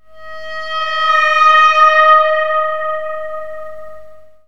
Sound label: strings, Sad